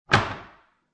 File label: Cabinet Door Close Wooden, Household, Cabinet Door Close, Wooden; Cabinet Doors